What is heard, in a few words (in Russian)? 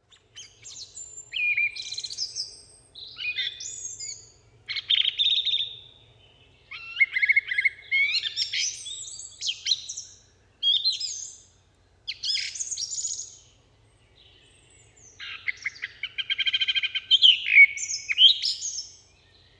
pevchiy drozd turdus philomelos; peniya ptits